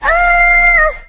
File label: oh oh SUNG female, Voice; ooh ahh